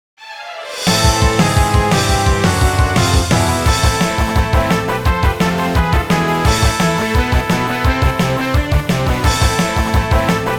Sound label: music tower boss; Dangeon Quest; android game music